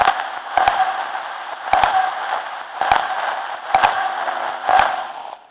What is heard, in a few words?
glitched tones Interference